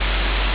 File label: ambience room tone